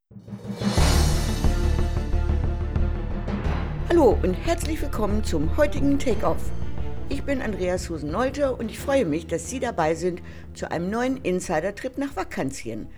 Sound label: Intro Takeoff